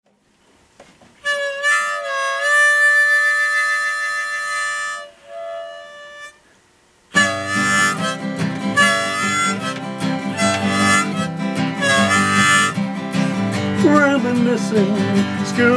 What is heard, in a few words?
guitar & harmonica; rain go away; 1990s